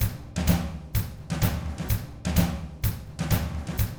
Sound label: 127 Drums